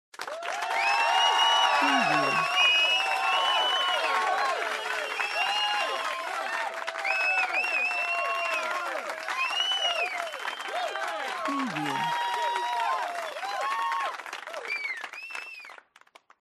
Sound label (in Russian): хлопки и свистки